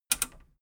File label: metal button radio click enable fast